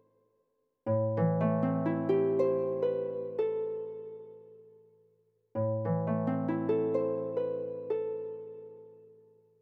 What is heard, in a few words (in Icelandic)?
Harpa